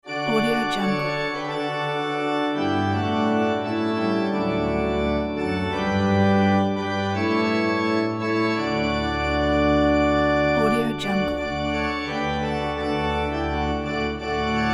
christmas song pipe organ; solatido

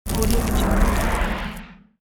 ice magic spell

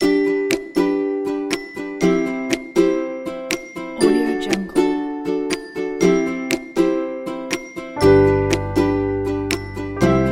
simple ukulele logo

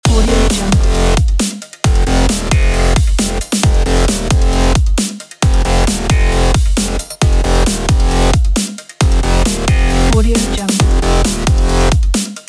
breaks loop

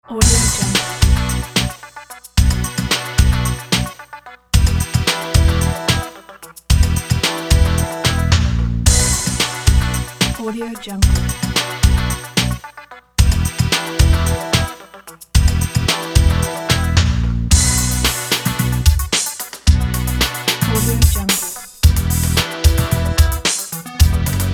keep up; ncmusic

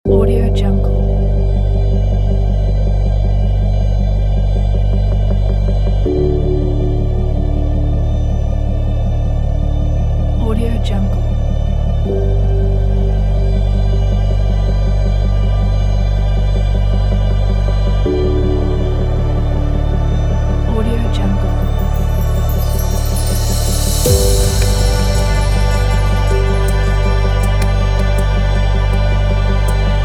atmospheric soundscape

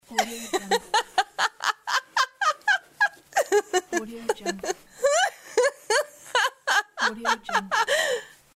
girl laughing

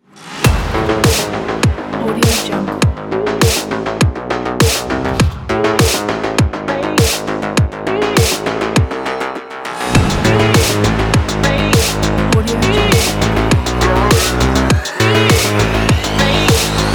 techno clash

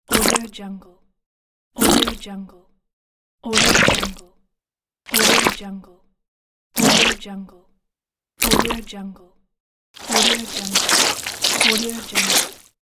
mud splat

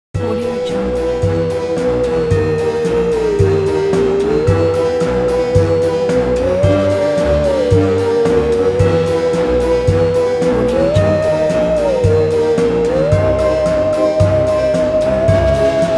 spooky halloween party; soundfruit

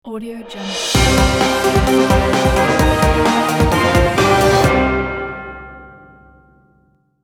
orchestral breaking news jingle ident

